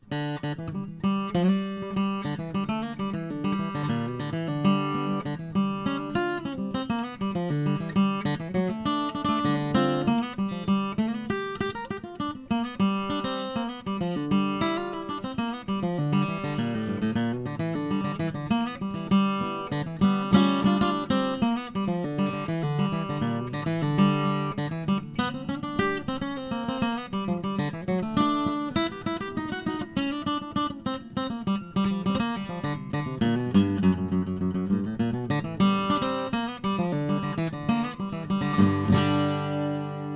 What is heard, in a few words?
1946 Martin 00-18; Chris Bozung